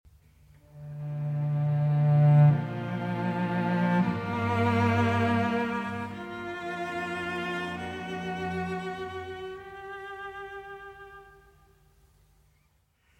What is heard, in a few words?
Cello Concerto in E Minor, Adagio; Op. 85